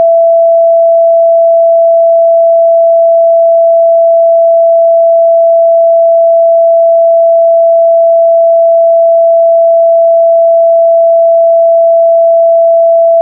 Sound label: E string E